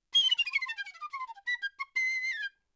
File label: Flute, RNR for piano